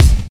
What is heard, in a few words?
rich highs bass drum sound c sharp key; kick